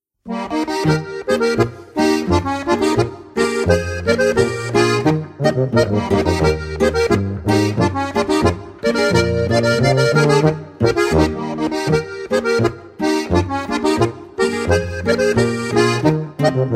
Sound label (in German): Boarischer; Am Schiessstand